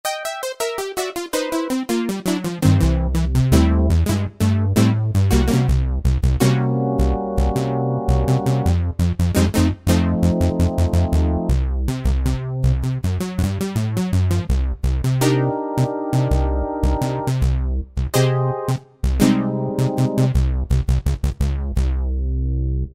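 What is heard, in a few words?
Pulse Comp, synthesizer, Yamaha EX5R; Factory presets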